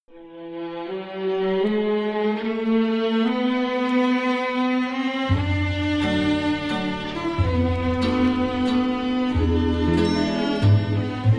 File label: strings with brass